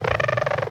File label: cat wind; Swift Cats